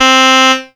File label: Yamaha PSS-280 Bagpipe C4